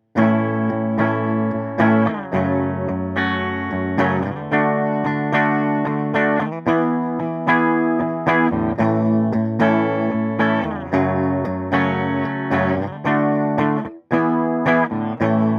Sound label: Twin Reverb, guitar